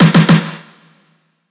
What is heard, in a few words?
snare triple; Drum One Shots; Slate; SD MIDNIGHT Sample Pack